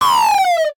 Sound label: missile